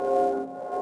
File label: nullify loop; player